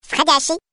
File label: message